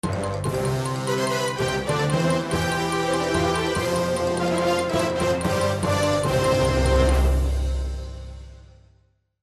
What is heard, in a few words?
open local news; Telefutura Noticias al Minuto opening (News)